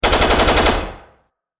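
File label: shooting rafale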